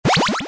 Player powerup Snd; Robot Wants Kitty

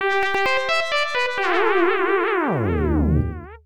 Unused Jingle; Cosmo Gangs; arcade

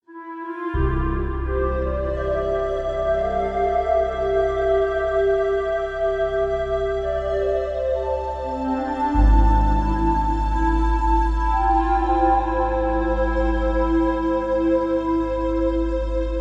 boss intro intensity head 172bpm; chicory a colorful tale; ps4

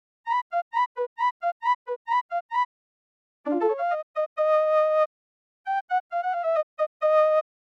Jungbeat

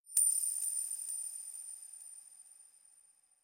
Silver Medal Acquisition Jingle; bayonetta

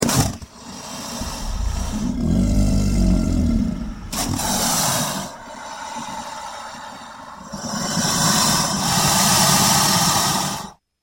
Walking Sound

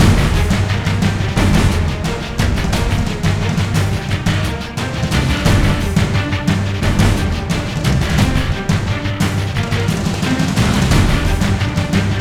Drone Action Low; modern combat 4 zero hour; android; Red Summit

